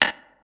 RIM, Hip Hop R n B; USB Soundscan vol.19; AKAI

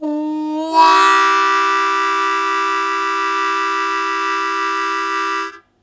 Blues Chord Attack C1, Harmonica; Zero G

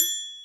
Dinr Bell, Alesis D4 drum machine

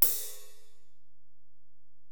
Ride, Alesis DM-PRO, Drum Machines

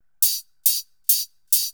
percussion loops, 138, Techno

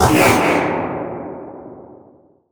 sfx sci fi; Masterbits - Switch FX

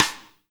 STICK; East Collexion; Drum 1

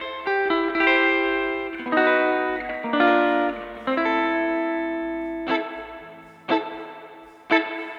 Guitar, 70s Analog Funk; Sonic Foundry (Sony Creative Software); What it Is!; Classic Caddy

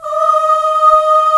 vocals mix; Greytsounds VOL-1

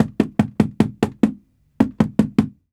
knock; AKAI S-Series CD-ROM Sound Library VOL-6

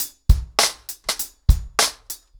100BPM, ACOUSTIC DRUMS; The Roots Drumloops

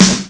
sdrum; ST-XX Amiga Soundtracker Sample Packs

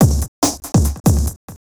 NRG Break, Trance, Drums, Breaks

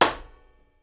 SNARE, Live Snares

